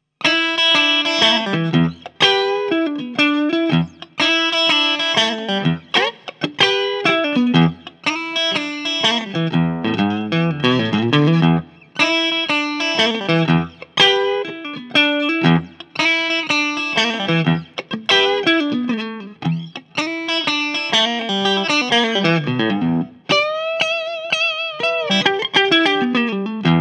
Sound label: Strat Middle